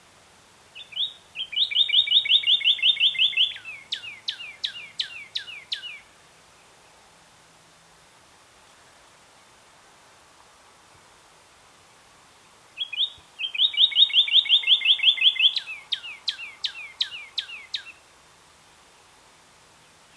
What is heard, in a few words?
Cardinal Key West